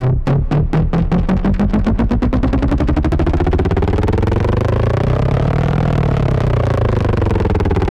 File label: Atmos FX, Atmosphere & FX; Loops; Touch Loops; BlissedOutBeats